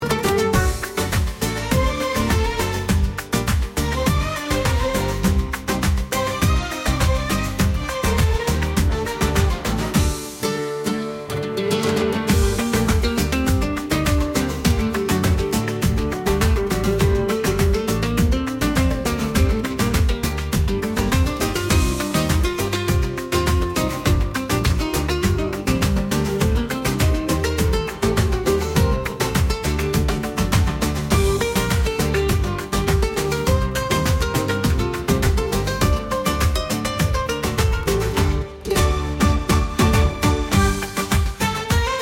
echoes of the sands, arabskaya

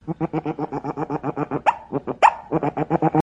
sound of an otter sea beaver

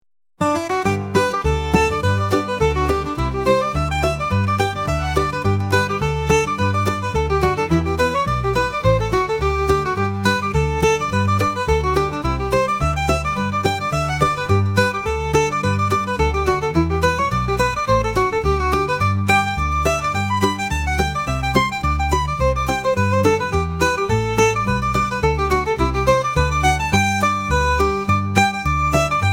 melancholic whispers, volinki

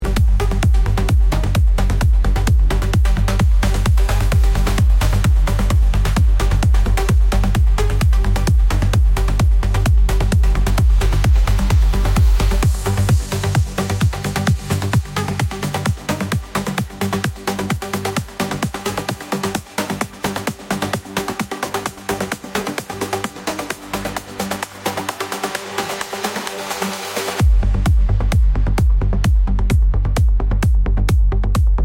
agressivnaya

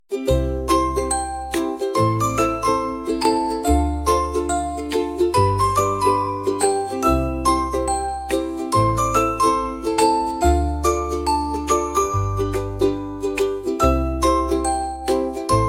joyful adventures; detskaya muzyika

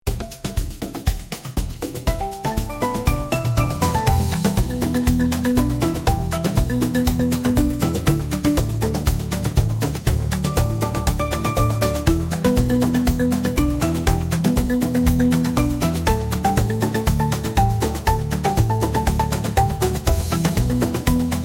tribal pulse, afrikanskaya

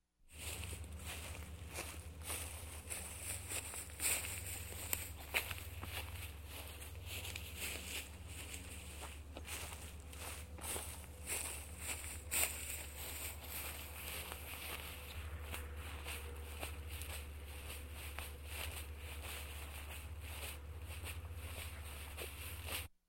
the sound of footsteps on the leaves

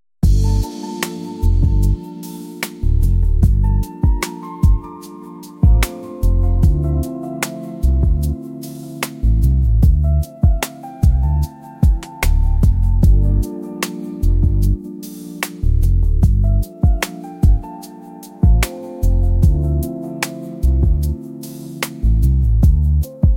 tranquil getaway, travel

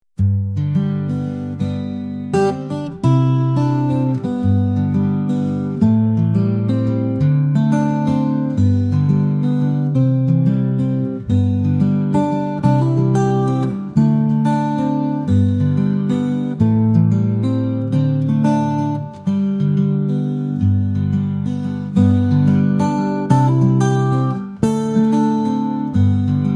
forest whispers, akusticheskaya gitara

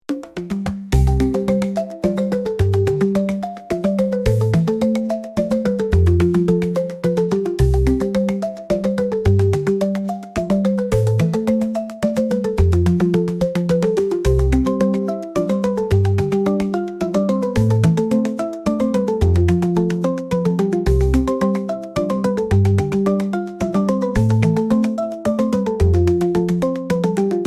tribal echoes, afrikanskaya

